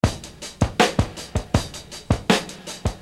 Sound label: Beat, Hip-Hop Street Beats